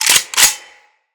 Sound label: Heavy Weapon Chamber, Reload; Weapons